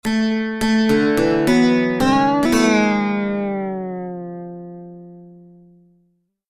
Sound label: lute riff; Neverwinter Nights 2